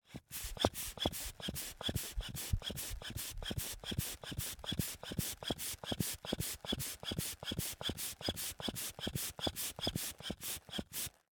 Hand bellows, leather, wood, brass, pumping, wheezing, blowing, supple; Eiravaein Works; Vaeyan IV